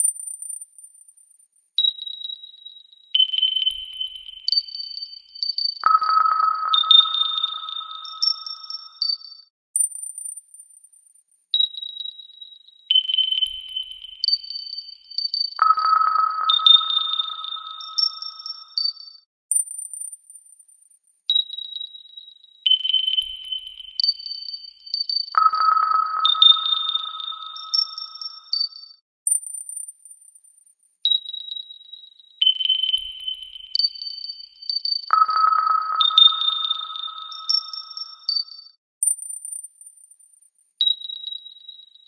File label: Drm loop fast